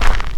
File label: gravel dug; everness